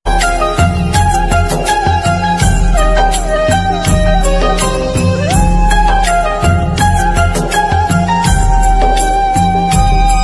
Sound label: Tamil love Feeling BGM Ringtone; ringtones